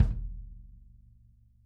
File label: kick; Sonic Reality; Interactive Drum Kits; 90s sample cds